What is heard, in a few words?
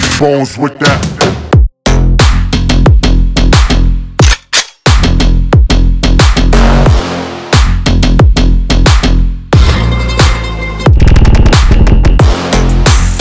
arabic